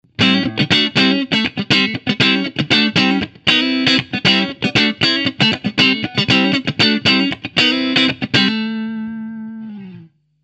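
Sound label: Strat50s neck JTM45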